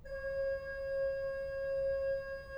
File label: organ